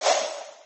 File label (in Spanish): estrella sale; paper dash